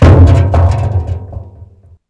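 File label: grass; Mafie 1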